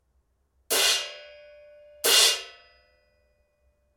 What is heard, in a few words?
zildjian